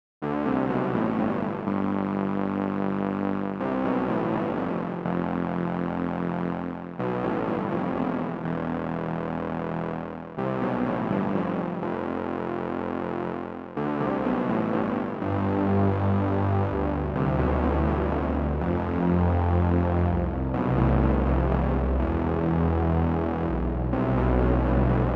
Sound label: Noises